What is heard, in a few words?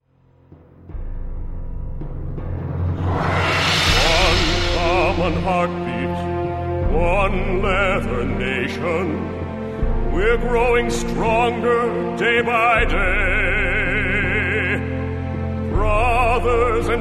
heartbeat